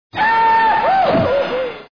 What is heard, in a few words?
goofy; geraeusche virtuell